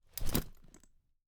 leather, metal, twist, contort, large, rough, lining, chunky metal, brass, zippers, drop; close; Eiravaein Works; Quirboilly